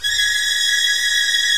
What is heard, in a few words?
VIO MAJ TRIL